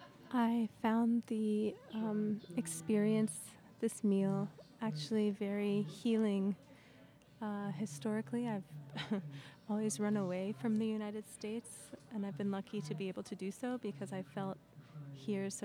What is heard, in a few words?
Audience Recording; a-meal-premiere